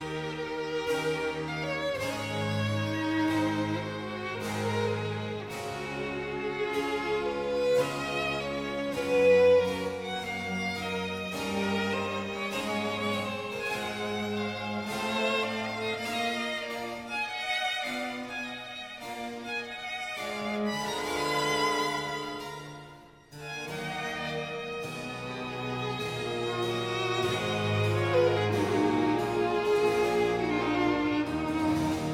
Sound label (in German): concerto g dur wq 169 fuer traversfloete streicher und basso continuo largo